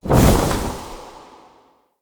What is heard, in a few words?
Casting Device Cast Dual; Weapons; Player; Casting Devices; Galene